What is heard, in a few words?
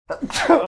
sneezing